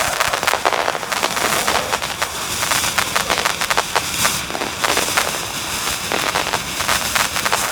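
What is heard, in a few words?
weapon thermite loop; gmod; viper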